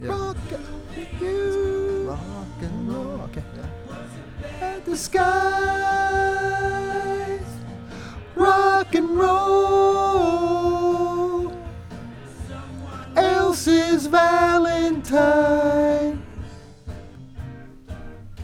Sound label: VOX Back